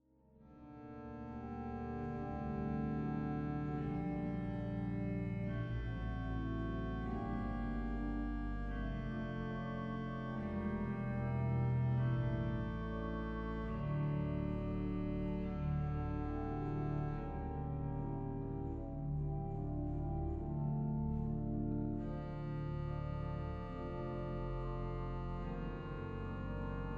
Klarinett; Rostock

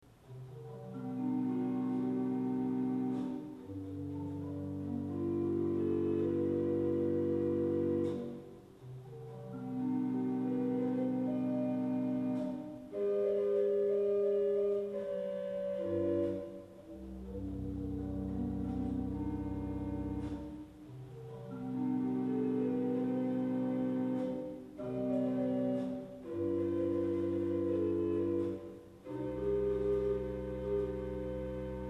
Bordun 16, Orgelstimmen; Orgelstimmen CD1

